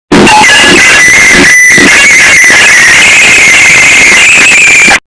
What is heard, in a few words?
fork